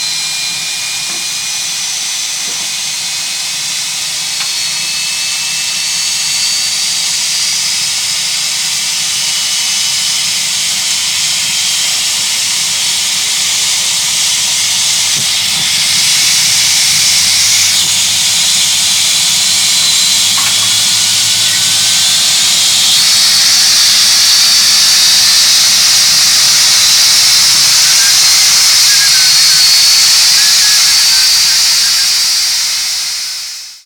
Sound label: V2500 startup, external; A320